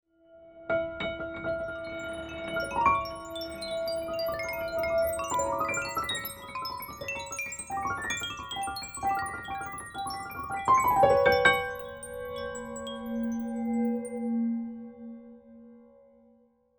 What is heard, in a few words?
Piano parts with electronic extensions; transitions from the pitches of one spectral source to another; Spectralism and Microsound